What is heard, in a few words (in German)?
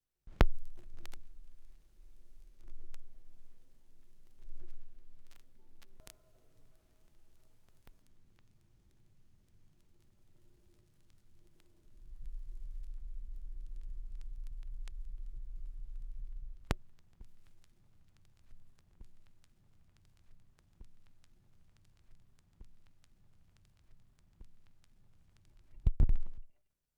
schallplattengeraeusche